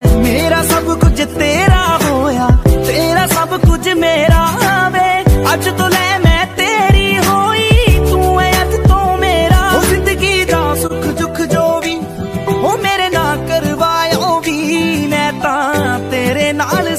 ringtone, bollywood ringtones

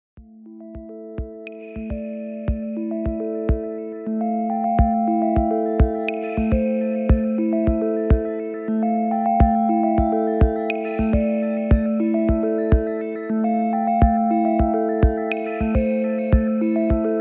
electro ringtone

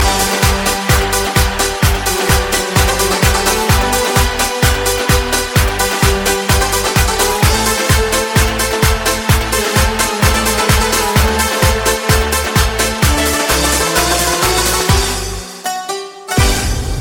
greek dance ringtone

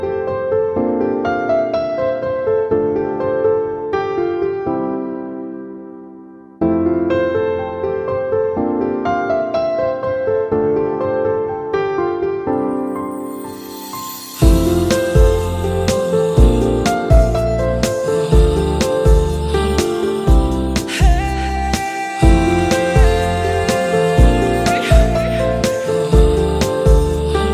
ringtone bollywood ringtones

